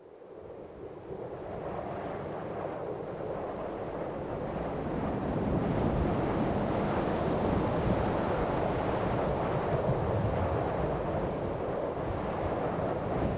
ambient

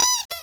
toy hit, toyhammer

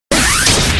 wire

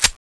clip in; tfa cso; sten mk2

